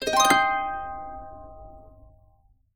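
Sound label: harp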